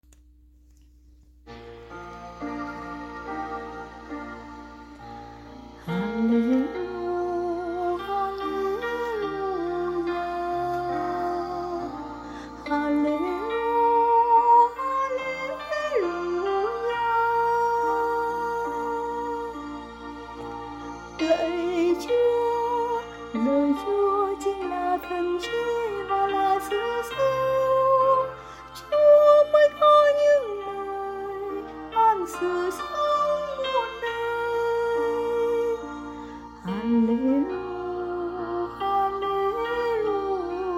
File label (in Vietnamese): Halleluja Nu